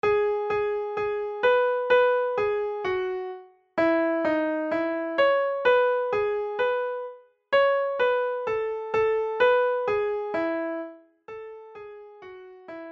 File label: solo, midi